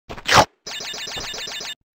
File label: wumpa collect; Crash Bandicoot